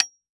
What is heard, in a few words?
Sports Bat Aluminum Baseball Bat Hit Metal